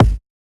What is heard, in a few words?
Soft Kick